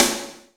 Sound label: SNR TV SN, snares; Roland L-CD701; Sn Modules 7